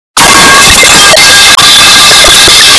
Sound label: pipe falling earrape